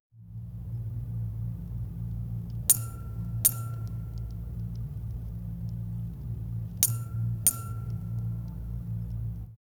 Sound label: asmr sound of a sharp